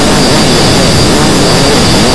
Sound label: egon run, weapons